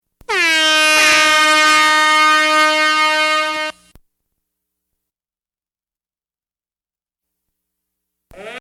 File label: Fog Horn